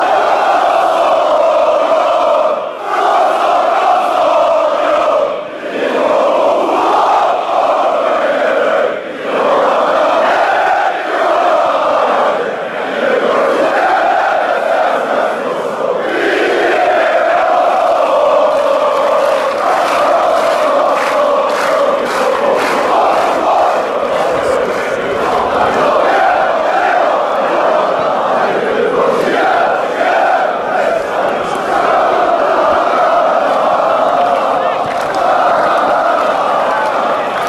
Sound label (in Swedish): vi e fran stockholm, fanchants sweden